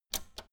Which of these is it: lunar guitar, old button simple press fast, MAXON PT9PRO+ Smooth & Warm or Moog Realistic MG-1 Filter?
old button simple press fast